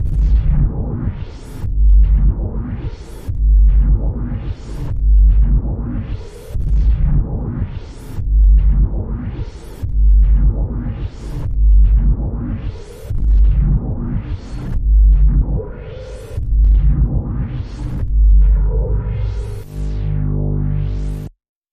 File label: Auto Drums